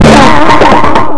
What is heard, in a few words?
LOOP DISTO 2; Big Beat Samples & More; AKAI; ALL LOOP KIT